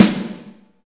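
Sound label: BOMB snare, drums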